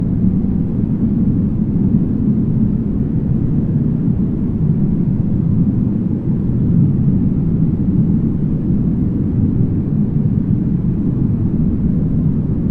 Water Ambience; Barotrauma; Subsurface

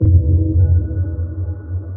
sonar Ping Air Far Shuttle Directional; Barotrauma; Real Sonar

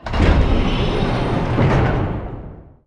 blast door move; Barotrauma; barotrauma ek

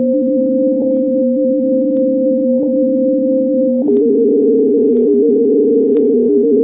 scanner; Thalassophobia Restored; Jobgear; Scientist

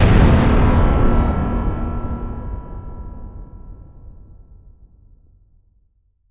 Sci Fi Explosion; Barotrauma; EK modern

